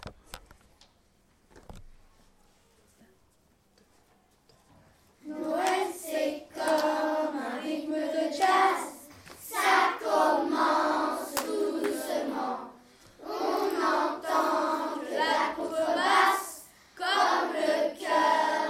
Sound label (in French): noël jazz